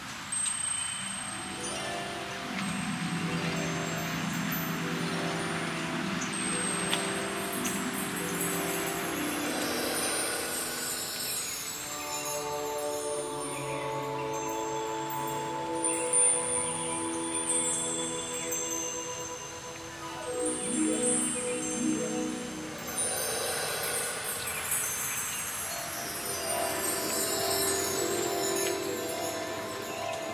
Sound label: Valentine Ambience